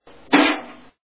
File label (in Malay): buang sampah